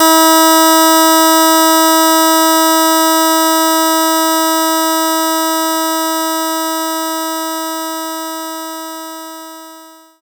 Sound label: Harpsichord E2